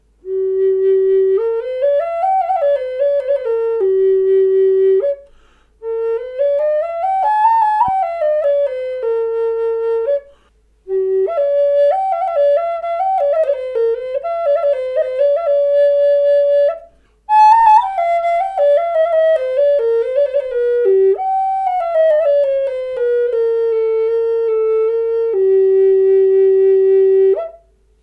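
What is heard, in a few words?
G4 a432 Spruce, Native Style Flutes; G#4 a432 Half-pipe in Spruce with Goncalo bird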